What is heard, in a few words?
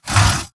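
Stalker Movement Servo Heavy Short; Titanfall 2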